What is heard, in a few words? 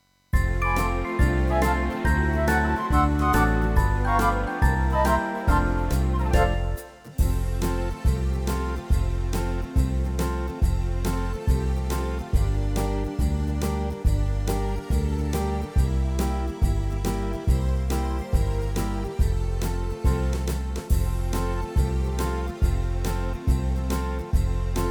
Jingle Bells Zonder Piano